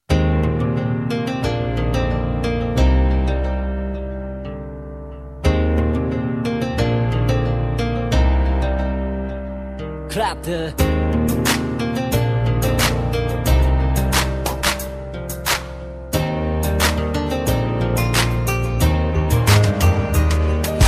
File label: Cool Tone